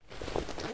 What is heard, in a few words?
Clothing